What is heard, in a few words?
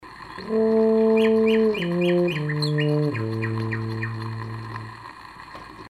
tuba